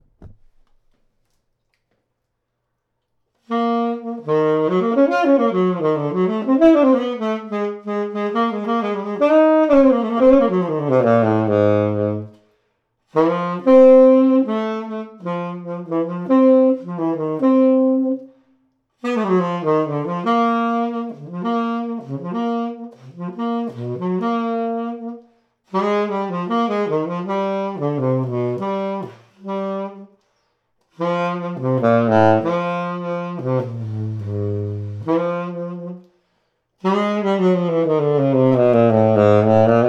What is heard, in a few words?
Tenor, saxophone; Jody Jazz HR